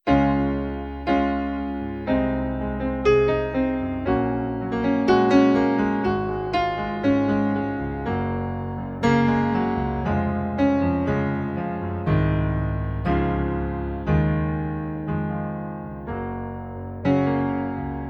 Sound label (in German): Db Dur 60 Bpm